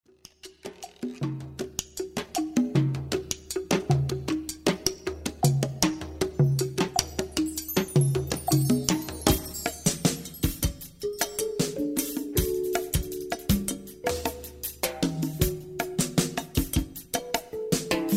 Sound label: Kalimba